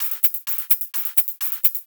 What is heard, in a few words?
Hihat Loop 128 BPM